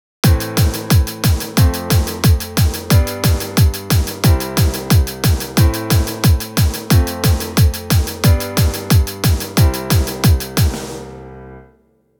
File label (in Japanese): オケ; fimmigrm melody ai review